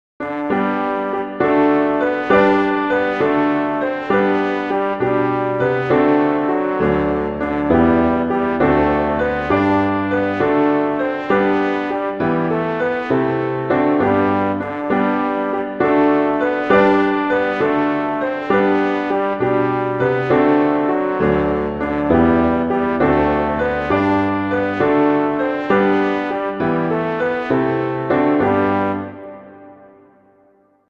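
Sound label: I Saw Three Ships, Christmas flute quartet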